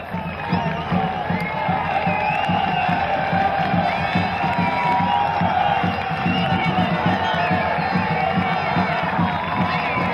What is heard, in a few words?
galway drums, chants